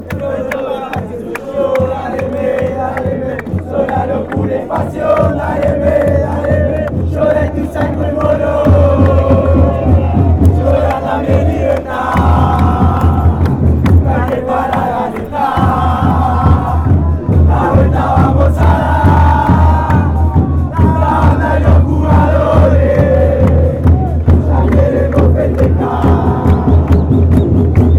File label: deportivo merlo chant